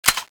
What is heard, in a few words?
clip out, weapons